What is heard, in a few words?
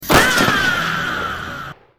FAAAAAAAAAAH GUNSHOT; sfx